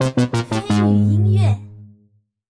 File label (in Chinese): 失败; 方块大乱斗; 《方块大乱斗》是一款多人对战游戏。方块大战全面爆发，创新竞技游戏方块大乱斗等你来战！自由移动吸收方块，合成更强大的巨型方块。与其他玩家激烈碰撞吧，弱者只能成为强者的食物